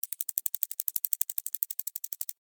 Insect Walk Move, Animal, Insect, Walk, Movement, Bug